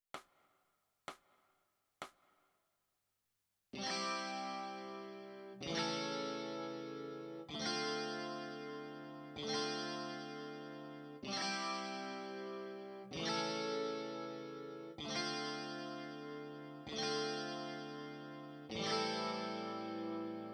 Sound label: E Guitar